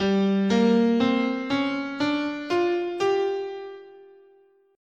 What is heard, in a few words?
G Blues, scale